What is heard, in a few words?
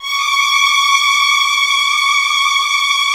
HF TRLL C#6, Violin; Q Up Arts; Denny Jaeger Master Violin Library; PERFORMANCE